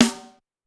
Snare, Pitched Up; Clavia DDrum-AT

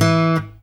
pop, Guitar

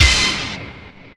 STAB

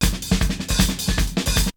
Drumloop; ZERO G - Datafile DISC 3

